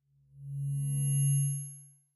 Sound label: Wash HF Elect Buzz Wash